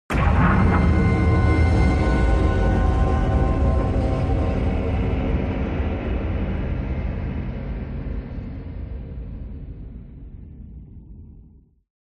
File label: CINEMATIC HIT Galactic Explosion; smartsound